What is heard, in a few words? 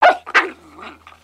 Pet Dog Small Happy; creatures; pets; Lego